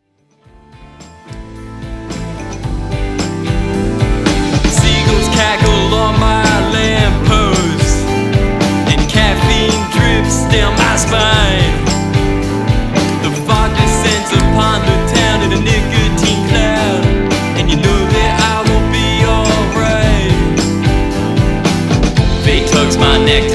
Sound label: mountain waves Band